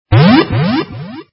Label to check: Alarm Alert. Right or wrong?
Right